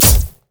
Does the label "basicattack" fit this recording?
Yes